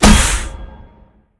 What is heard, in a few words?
pwr hit stun god; smite; gilgamesh